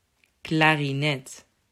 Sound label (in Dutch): Klarinet